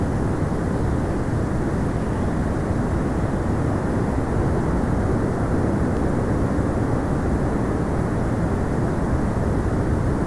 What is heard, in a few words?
WFR audio emfisis